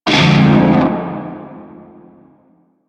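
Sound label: Shawzin Minor Chord B; Music; Warframe; Narmer Shawzin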